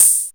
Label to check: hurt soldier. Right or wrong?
Wrong